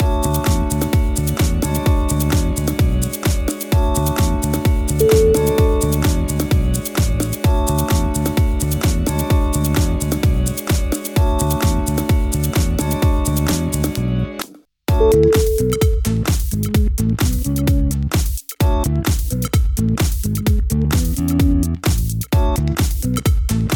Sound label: lounge house